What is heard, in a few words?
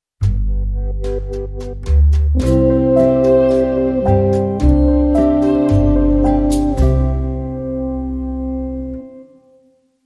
Alpine Romantic, Sting